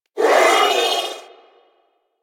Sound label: Sentient Symbilyst Pain; Enemies; Barks